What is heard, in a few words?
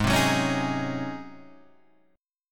Ab 11th Ab Guitar Drop D; strummed; JGuitar